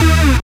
SYN RAVING, dance synth; Sound & Vision - Gigapack I CD 2 (Roland)

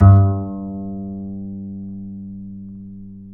G 3, Bass; Spectrasonics; Bass Legends

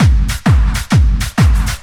techno, DRUMLOOPS

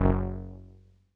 505, String Machines

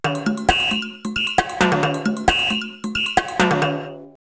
727 134, drumloops, DrumMachines; X-Static Goldmine; 90 s Sample CDs

